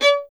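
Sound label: STR violin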